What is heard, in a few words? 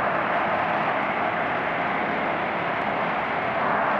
sparse soundscape samples; ReLooped